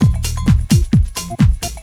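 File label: bass drum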